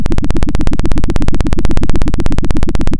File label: Sound of a helicopter